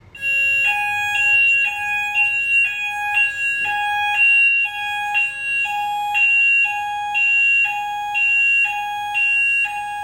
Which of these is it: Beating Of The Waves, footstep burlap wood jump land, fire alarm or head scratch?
fire alarm